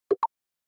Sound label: Percussive Accent Low